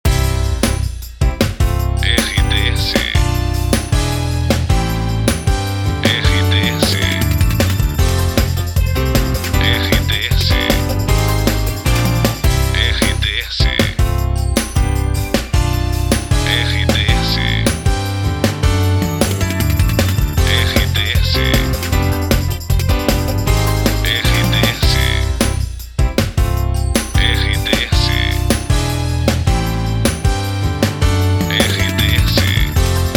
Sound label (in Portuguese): trilha para locução; Prata FM 2021